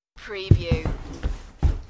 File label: Body Get Up